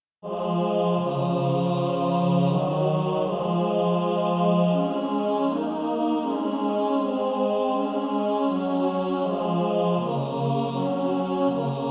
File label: Male Choir; I Praise God’s Love Male Choir Sheet Music TB 2-part